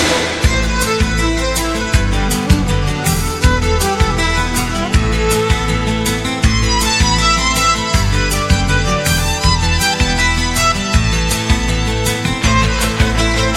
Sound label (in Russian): скрипка и гитара; ричард бах и антонио вивальди